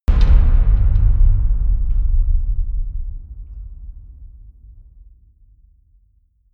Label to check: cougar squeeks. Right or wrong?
Wrong